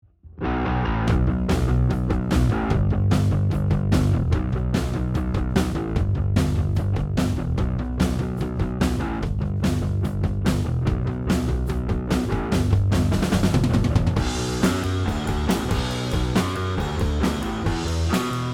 Drums and Bass

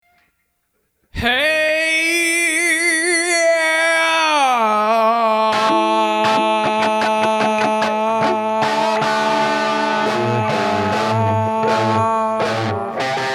Side shuffle blues

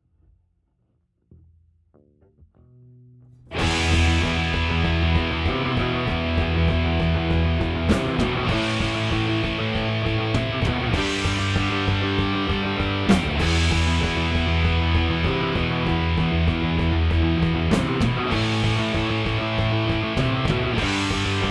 w Guitars

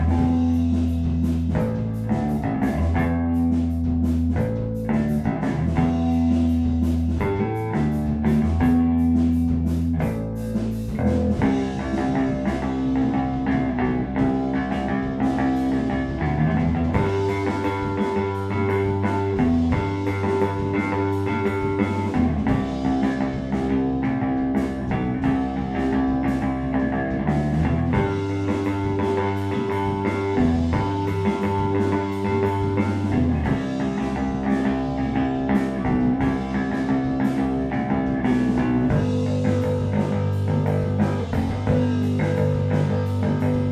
BASS, Room

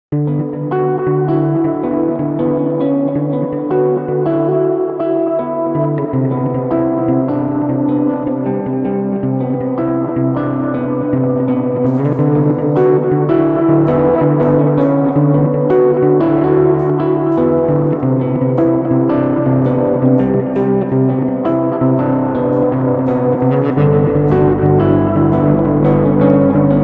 Guitar Bass Monitor Mix

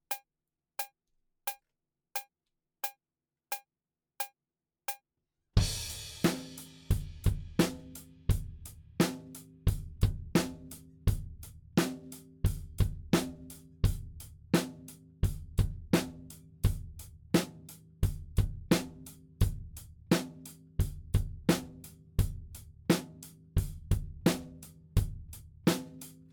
Drums ONLY; Pre Pro

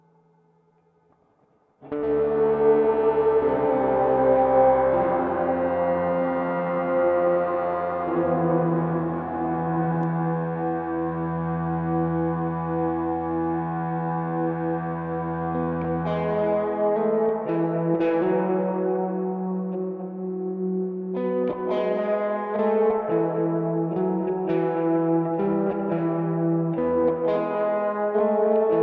DI Line Guitar

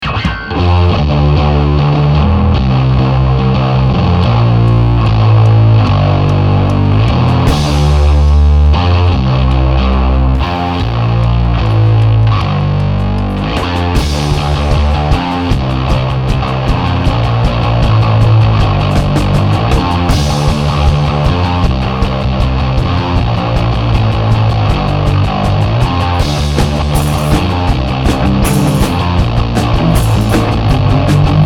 Warm up rock

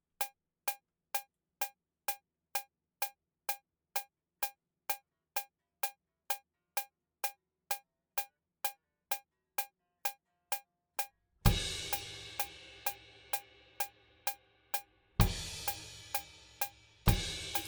Drums ONLY

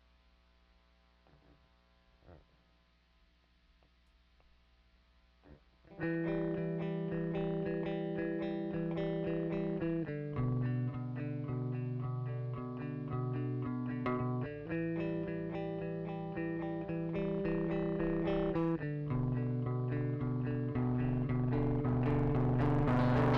Drum Stems

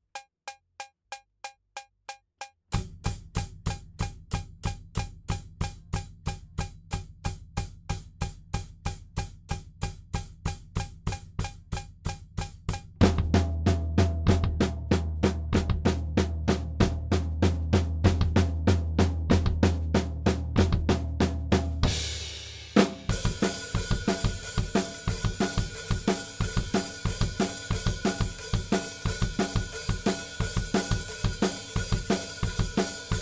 Drums ONLY; Pre Pro

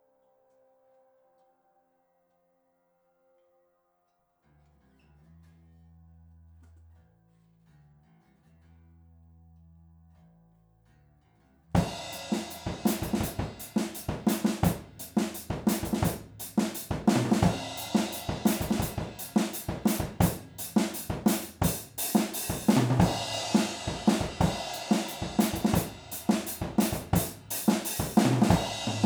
Drums; Rode OH

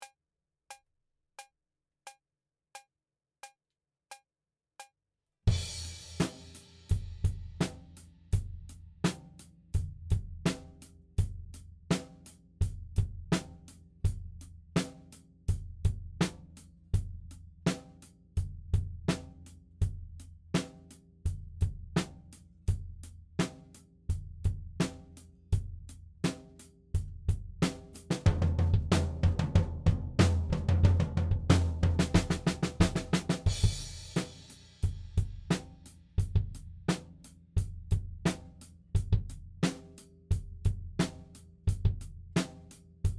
Drums ONLY; Pre Pro

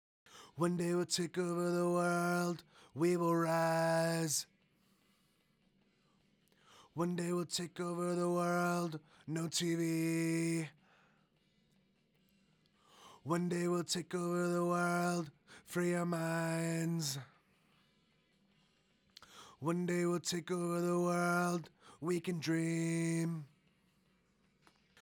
Vox Verse High

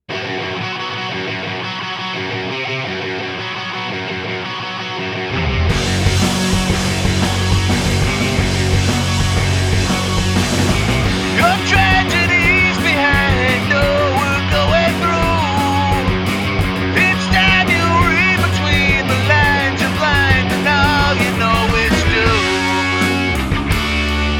Vox

